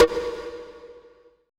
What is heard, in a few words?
ETH DRUM